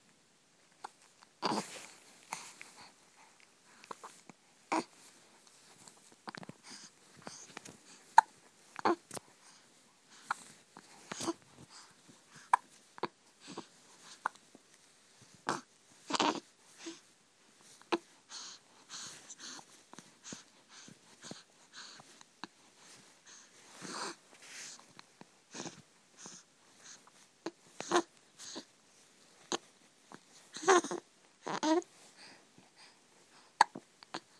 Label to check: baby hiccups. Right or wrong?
Right